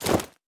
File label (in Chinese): 装备狼甲声